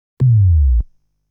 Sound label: Coin insertion sound; legend of hero tonma